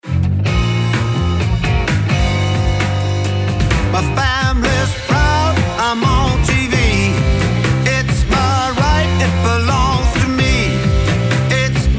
Sample Blues Mastered

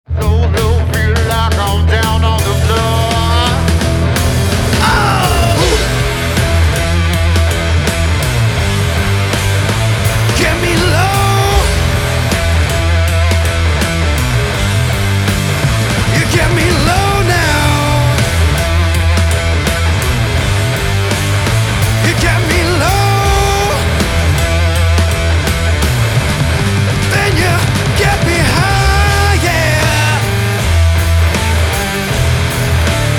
Sample Rock Mastered

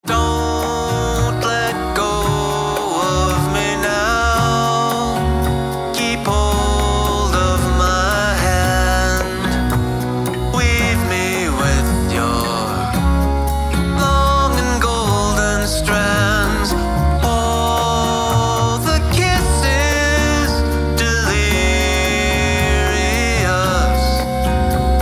Acoustic Mastered

